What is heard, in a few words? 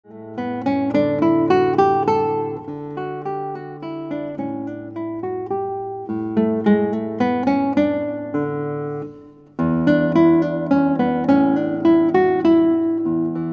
Guitar